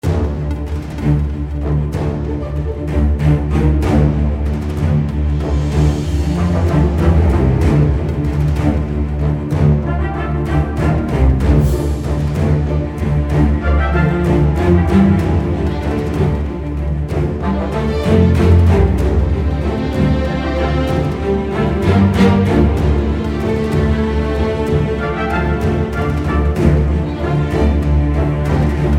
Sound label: Dark Hunger 190bpm; soundcritters; grimoire